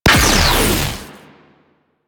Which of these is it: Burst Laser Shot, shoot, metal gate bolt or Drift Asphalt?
Burst Laser Shot